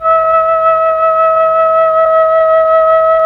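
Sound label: Mellotron Flute E5; Tron Flutes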